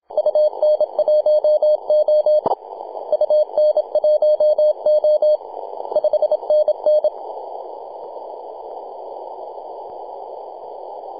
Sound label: 28.008MHz CW